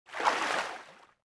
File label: water wade, footsteps